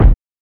fluffy bass drum d sharp key, steel kick drum sample tuned to the D# note